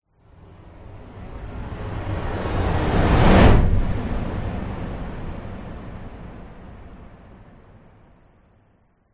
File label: whoosh; orlando lanzini